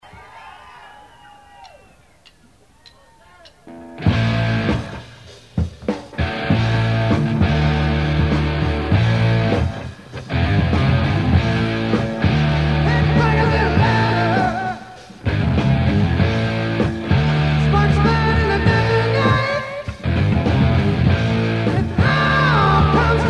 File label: Heavy Metal Noise; FreeFlight